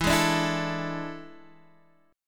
A Major 7th Suspended 4th E

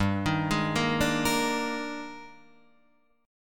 D sharp Major 11th, G, guitar chord

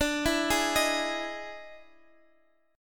E Major 7th D, E/D Major 7th chord; EM7/D Chord {X X 12 9 9 11} arpeggiated

